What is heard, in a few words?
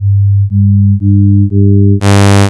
Sawteeth Sum